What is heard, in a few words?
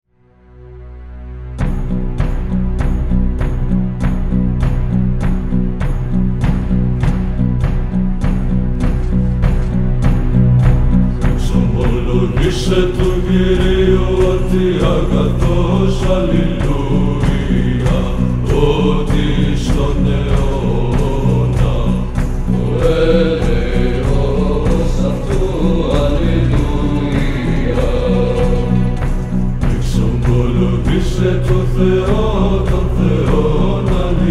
epic byzantine music; Psalm 135